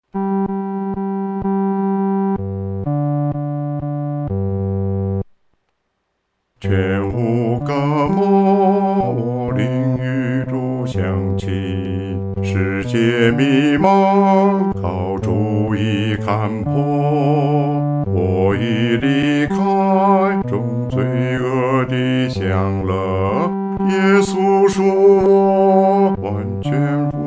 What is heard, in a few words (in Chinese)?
独唱 第四声; 全无隔膜